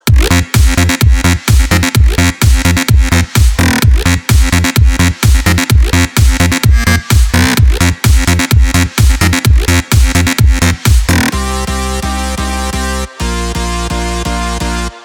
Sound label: instrumental version